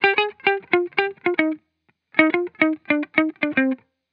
Vibrations Pop Guitar Loop 110 BPM D# Maj; Cymatics; Vibrations Guitar Sample Pack

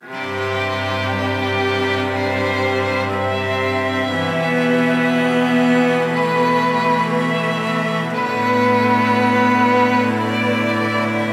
Solo String Section - C 120BPM; Construction Kits; STEMS; Ghosthack; SkyRealm; Kit 11 - Warriors Cry

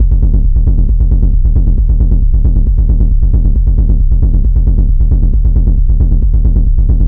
Bass Loop B 135, Techno

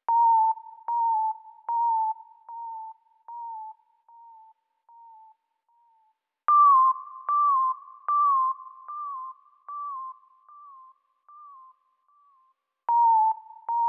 75 BPM G Min Accent; Melody Loops; Pistachio; Cymatics; ANALOG EVOLUTION Beta; Lofi Collection

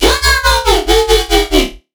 Riddim Bass Shot (140); Dubstep; Riddim Basslines; FMRI; Ghosthack; Advent Calendar 2019 Day 17; Riddim Bass Shots

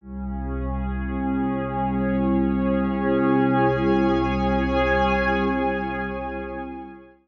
Pad; Dubstep

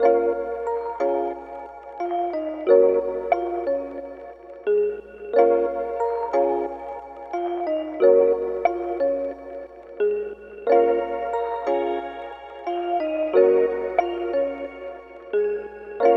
Melody Loop 180 BPM A# Min, Hip Hop; Melodics; Melody Loops; Cymatics; Cobra Hip Hop Sample Pack

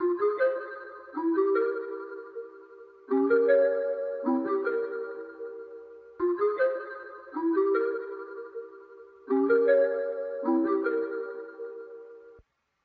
155 BPM F Min Key; Loop Stems; Cymatics; 2022 Melody Collection Platinum Expansion; Warped

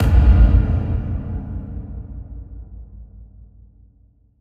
House Impact; FX; Impacts

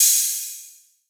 OH; Hats and Cymbals

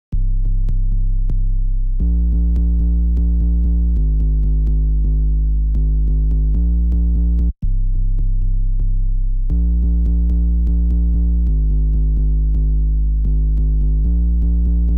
128 BPM C Min Sub; Cymatics; Drop Loops; Golden Hour

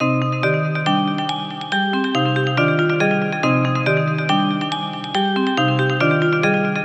Dark Melody Loop - 140 BPM C Min; Cymatics; Oracle Sample Pack

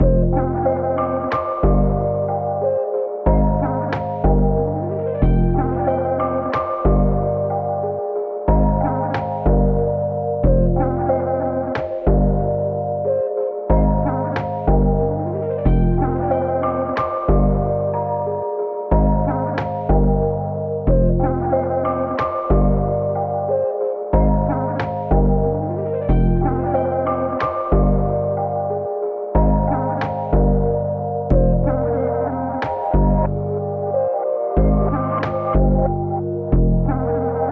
92 BPM C Min; Cymatics; Zodiac Vol 2 Beta Pack; Zodiac Vol 2 Premium Compositions

